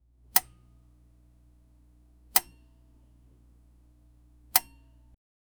light bulb sound, electricity

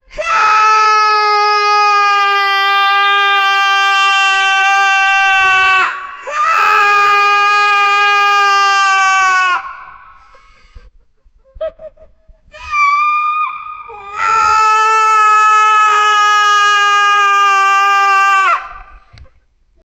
a man squealing and screa, screaming; sound effect

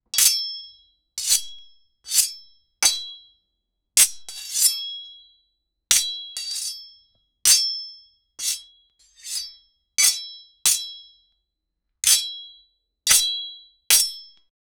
swords deflect sound

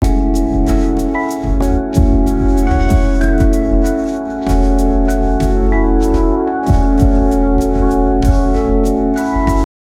lo-fi instrumental

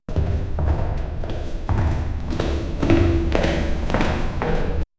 slow footsteps of a man; small room

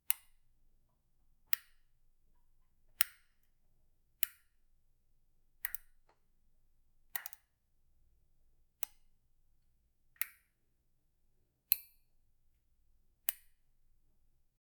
describe the sharp click

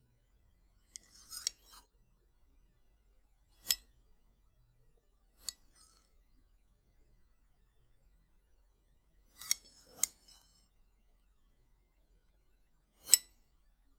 the knife moves up and, food preparation

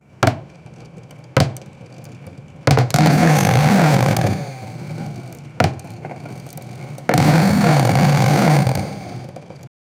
wood carving sound; woodworking

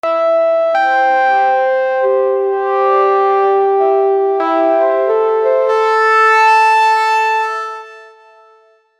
synth in popular music

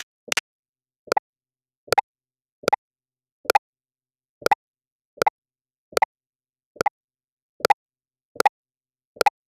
cartoonish collision

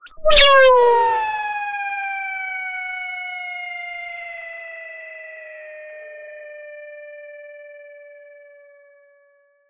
cartoon metallic spring

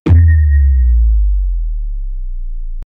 legendary loot drop sound

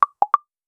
cute dating app notificat

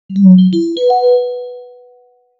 notification sound, chat

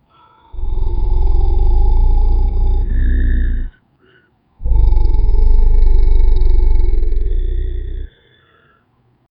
a ferocious monster

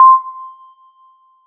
electronic tone c

